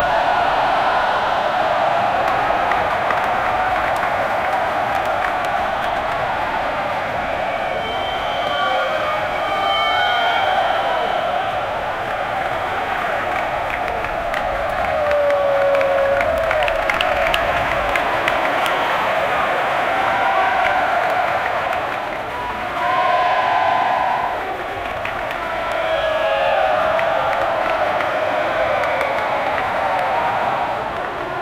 crowd cheering loudly soc

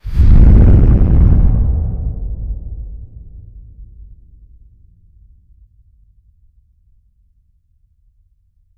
big rumble like an heavy, collision

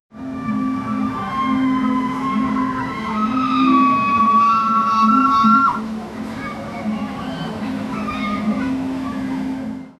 distant carnival heard fr

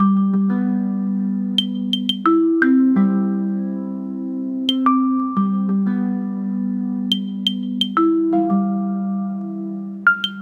loop 86 bpm, upright bass

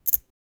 key grabbing sound; everyday sounds

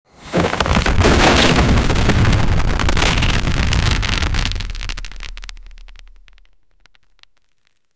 a long heavy dramatic tea, earthquake

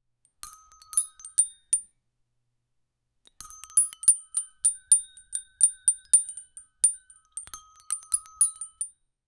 toy chimes squeaks

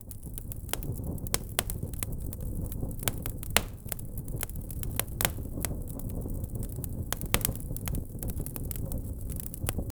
fireplace

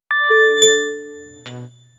mission complete video ga; achievement

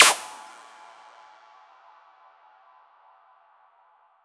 synthetic retrowave cosmi

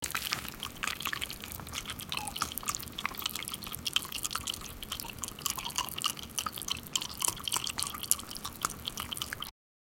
fries

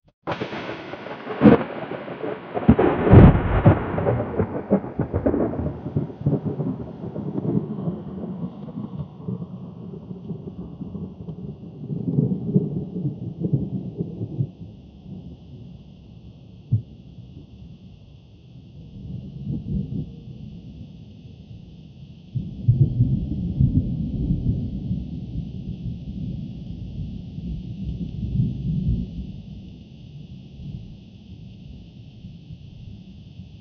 thunder sound effect summ

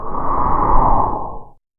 light wind blow; bow

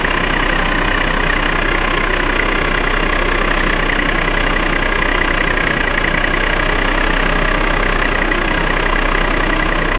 marine diesel engine

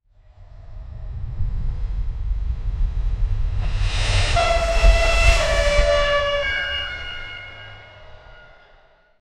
steam train

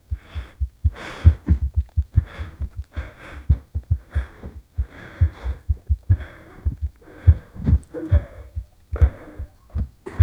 back muscles growing pump

